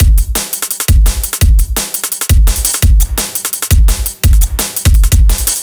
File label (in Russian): Extended Drums, сэмплы ударных drum bass